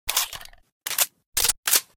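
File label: sr vereska reload; STALZONE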